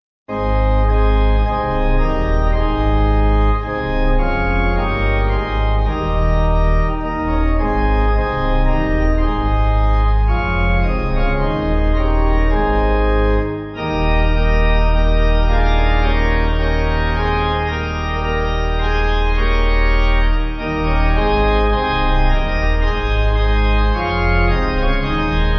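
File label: Pipe Organ, Music Backing Files for Churches; O Worship The King; Lyons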